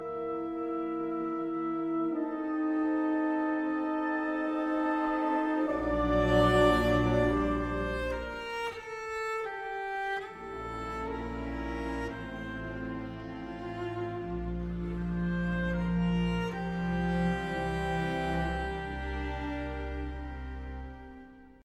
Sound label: CELLO